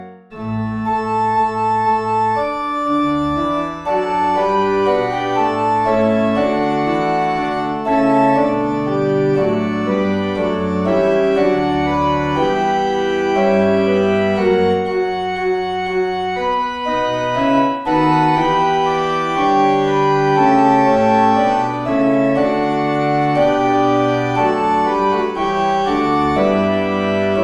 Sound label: Organ, extract